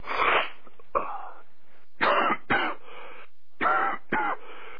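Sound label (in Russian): кашель мужской влажный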